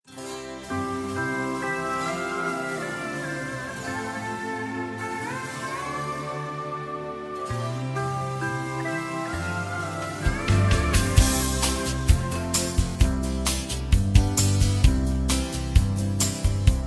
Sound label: No Backing Vocals